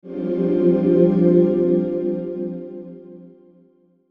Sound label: Logo Swell Delay; Sonic Logo Sound Effects; Production Element Imaging Element Accent Transition